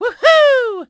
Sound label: jumpspin "woo-hoo"; Mario; Super Mario Sunshine